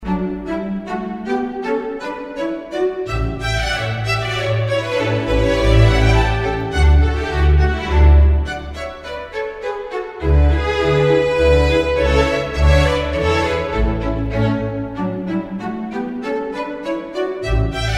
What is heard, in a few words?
Minuet